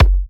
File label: F# kicks; markus hakala kicks